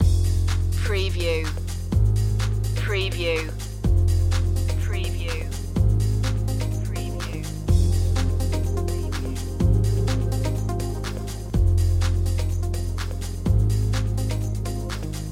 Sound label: trip minimal loop